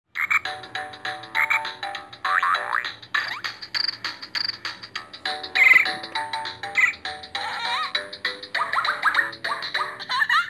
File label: Kid Tone